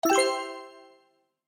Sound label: correct